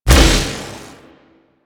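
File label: Madurai Contamination Wave Projectile Impact D; Warframe; Focus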